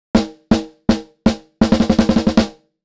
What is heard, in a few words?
snare short, gate snare; SSL X Gate